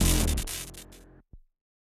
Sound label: Laser End; Avie